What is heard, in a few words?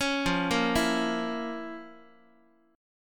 F Major 7th Suspended 4th Sharp 5th F, Guitar; arpeggiated